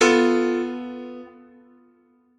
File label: piano